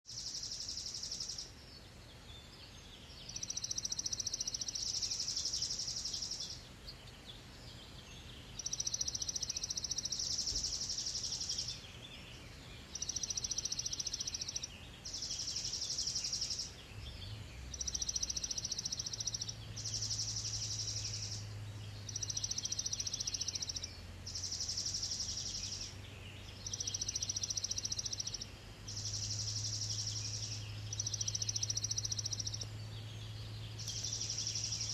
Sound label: Birds Early morning sounds